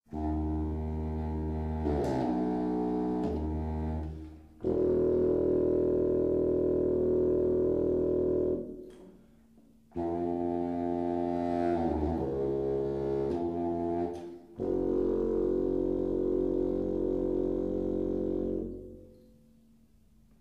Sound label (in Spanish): Contrafagot